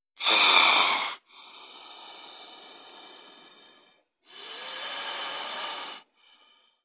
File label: Snake, Bull Breaths, Multiple. Close Perspective.